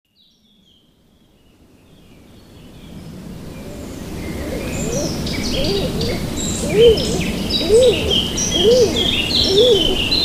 Kék galamb

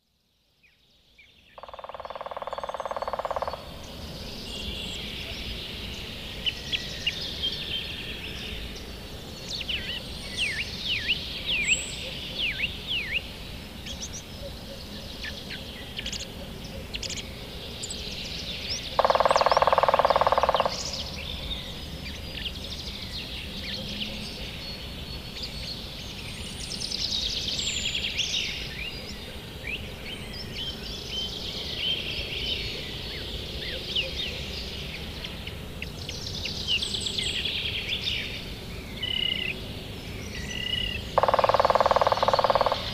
Hamvas küllő

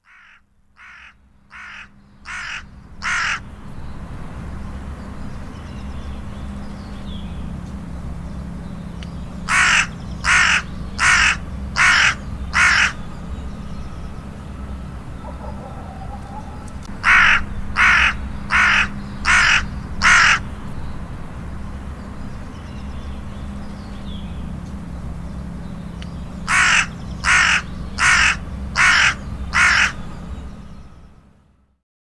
Dolmányos varjú; Madardalok

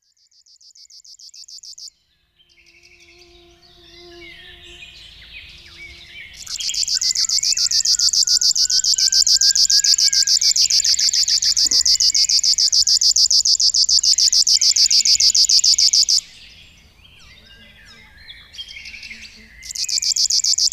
Berki tücsökmadár; Madardalok

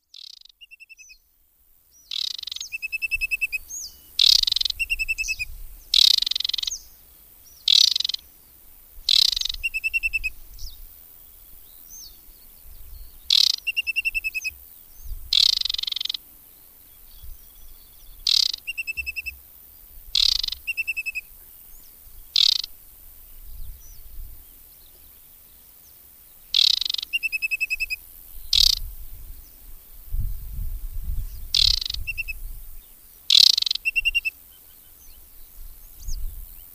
Csíkosfejű nádiposzáta; Madardalok

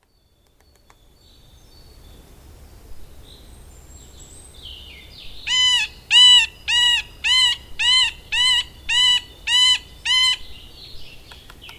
Közép fakopáncs

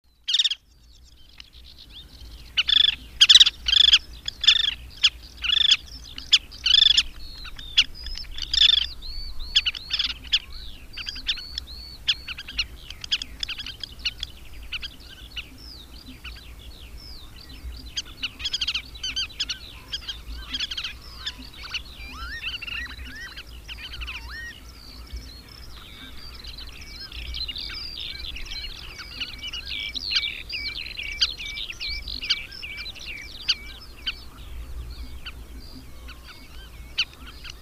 Székicsér; Madardalok

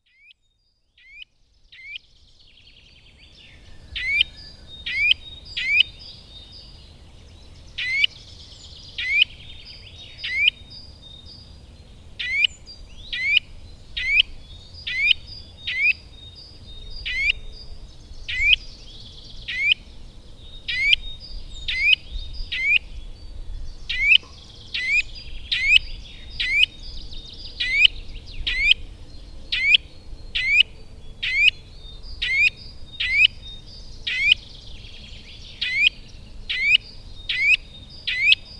Csuszka

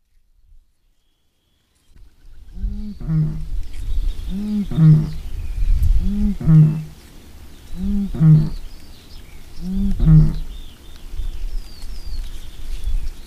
Bölömbika; Madardalok

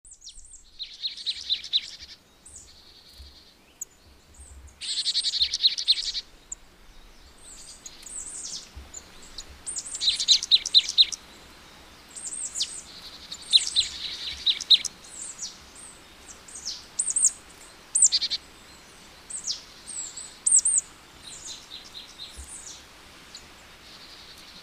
Barátcinege

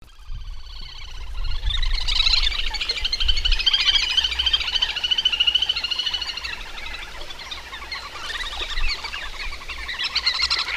Kis vöcsök; Madardalok

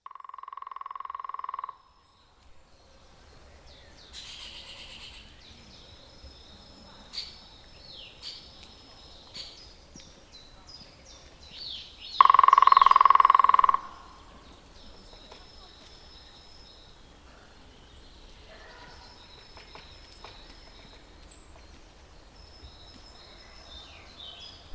Zöld küllő; Madardalok

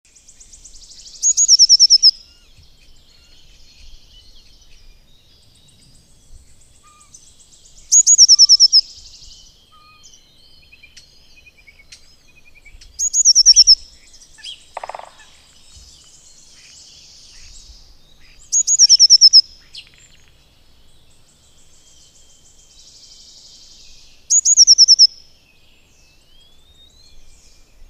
Kék cinege

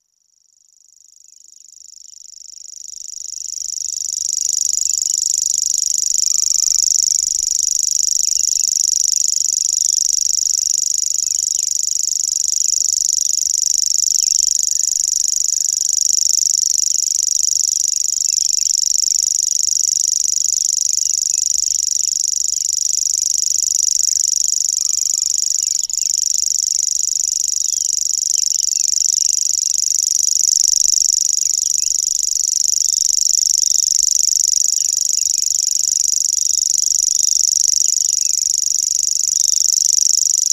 Réti tücsökmadár; Madardalok

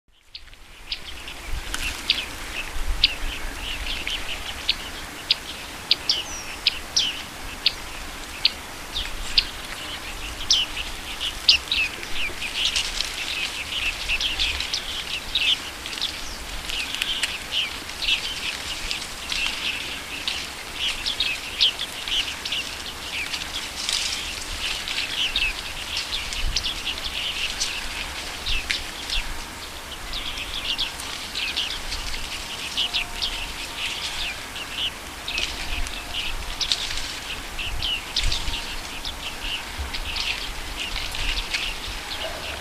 Barkós cinege; Madardalok

